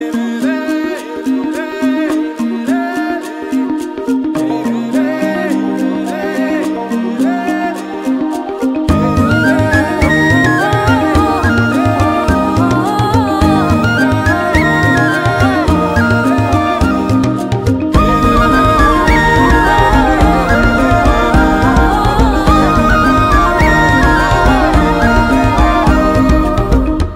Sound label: Starting Tone